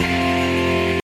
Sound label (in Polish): przegrana